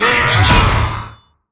attack; Gallia

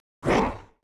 Temple Gremlin hit; Arenill

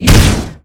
Thrust Att; Ragefighter

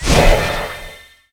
Shadow Step skill; MUDevs S20.1-3

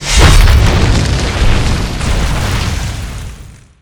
Mine Explosion; Ferea; King

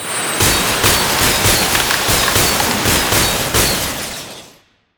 Ice Rain; Nix skill; MUDevs S20.1-3

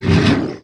hit; NarsElementalKnight

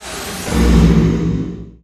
Armor Break; MUDevs S20.1-3

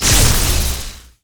Storm Fiend attack; Tormenta Island

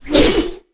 Sword Inertia start; MUDevs S20.1-3

